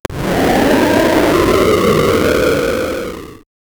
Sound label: warrior roar pixel art